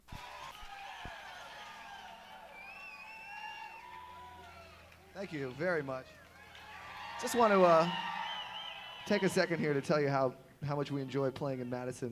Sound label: Crowd; Madison, WI; Barrymore Theatre